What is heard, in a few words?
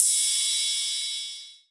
harsh, Hats